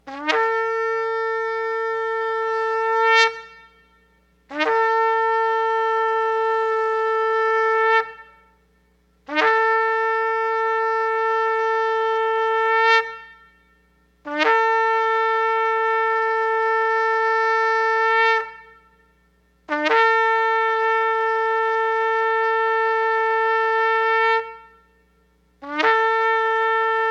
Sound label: Shofar Blowing